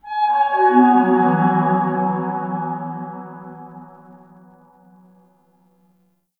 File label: Cascading Fifths Flower Structure